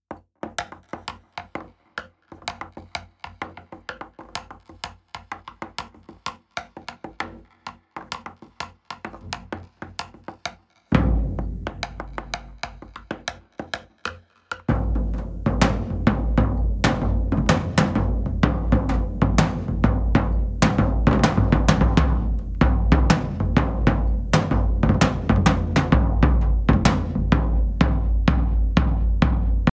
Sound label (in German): STICKS AND DRUMS SOLO